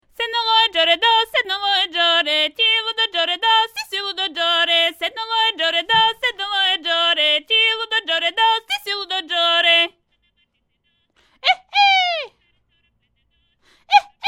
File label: squeaky top, Choir